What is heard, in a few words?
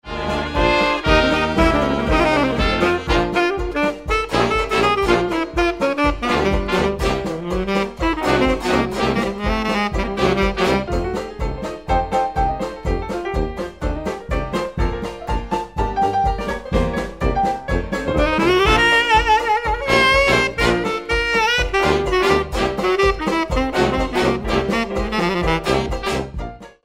sax